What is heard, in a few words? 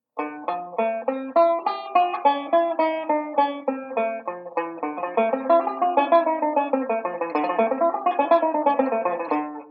ii V Speed Drill, banjo; Beyond Chord Melody Volume II